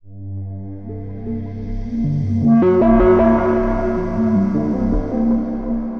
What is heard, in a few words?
D+B 160; eJay